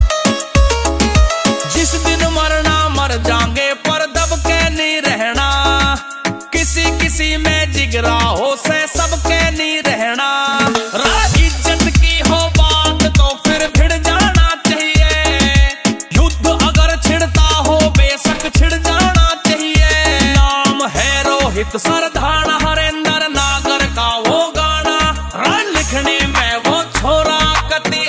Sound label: Ringtone